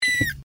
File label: Meow